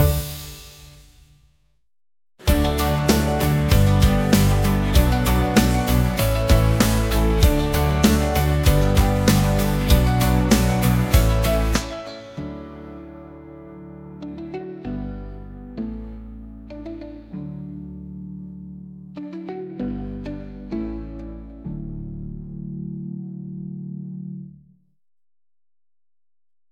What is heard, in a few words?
Logo Pop